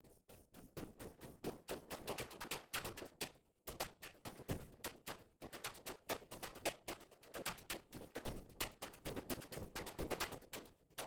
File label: CONGAS Residual Noise